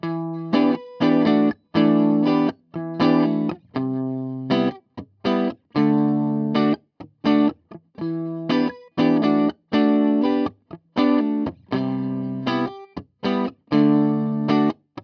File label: ampeg gvt52-212 clean